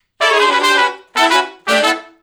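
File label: Funk Riff (G) harmony, Horncraft for R&B Sections; Crimson Blue and Fabulous Horncraft 4 RnB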